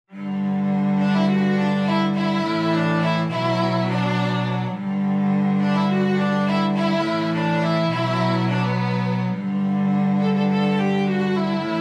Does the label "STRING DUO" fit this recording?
Yes